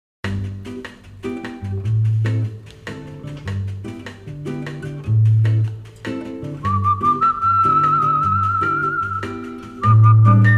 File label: ATMOS Dub Bossa; CDKITSCH